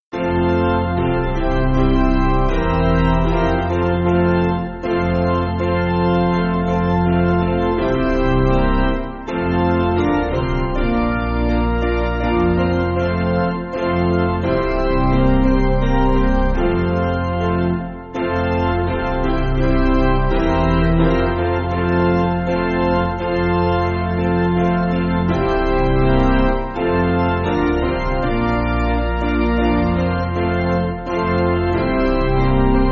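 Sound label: Piano, Music Backing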